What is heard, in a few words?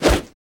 Punch Swing; Afterbern Democralypse; Windows